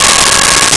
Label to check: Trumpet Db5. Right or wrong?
Right